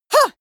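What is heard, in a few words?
female combat attack short, screams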